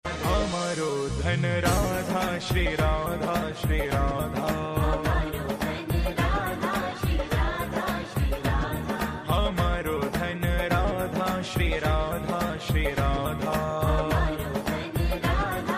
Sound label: Bhajan Ringtone